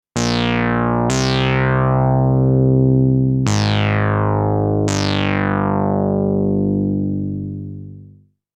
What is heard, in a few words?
Bass reso medium